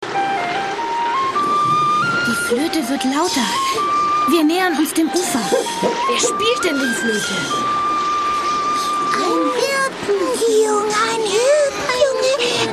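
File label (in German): flöte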